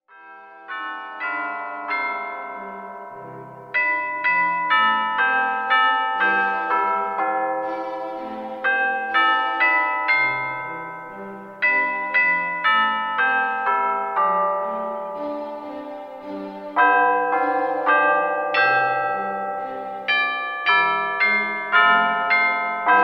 Tubular Chimes w Strings; Danny Boy

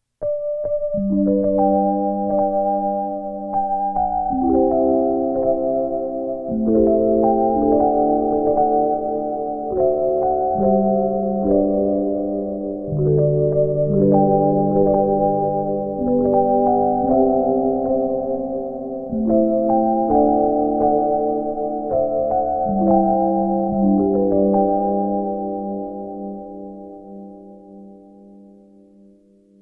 Harp Bells, prelude concerto carillon

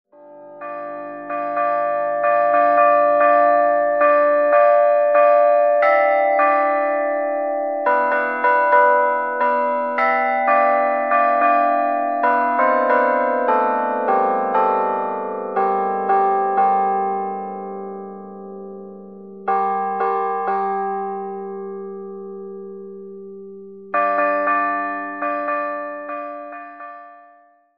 Wedding Song Traditional

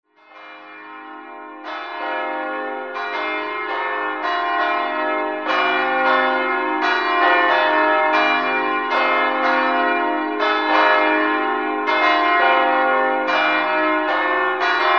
3 Bell Peal

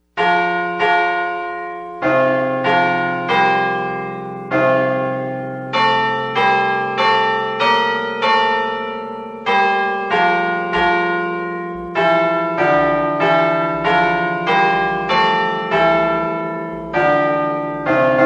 American Bells, Digital Carillons; electronic carillon bells

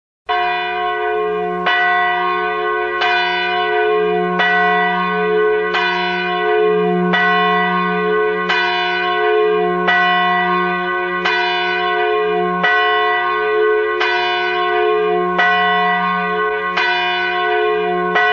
Single Bell; Verdin Bells

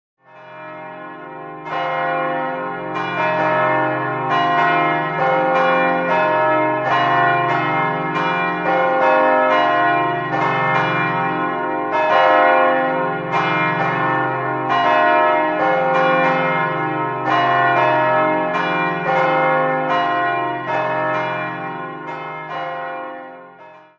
Peal Bells; THREE BELL PEAL – CAST BRONZE BELLS

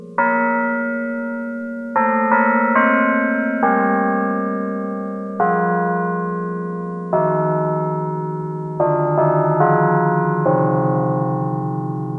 European Bells

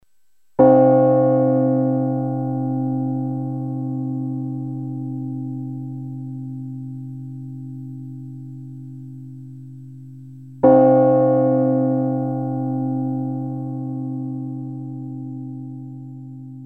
Funeral Toll Bells; Cast Bronze Bells; Verdin Bells

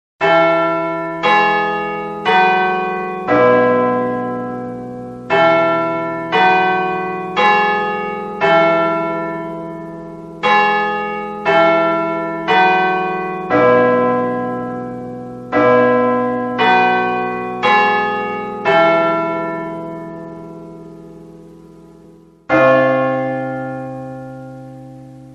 Westminster Bells, electronic carillon bells

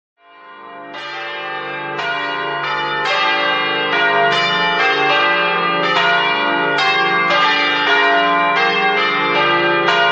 Peal Bells; Verdin Bells

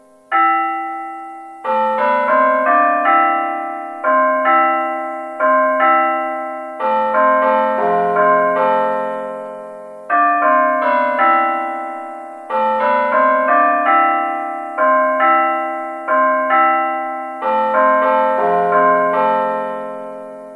Flemish Bells

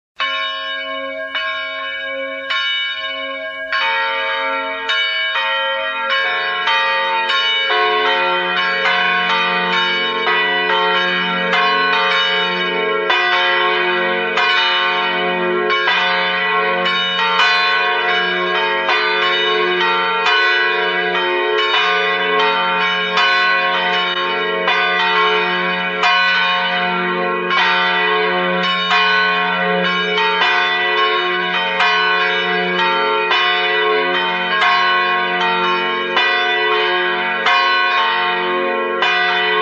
Peal Bells; Verdin Bells

